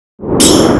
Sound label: WATER; shinkiro shiranui; SS FOUR CHARM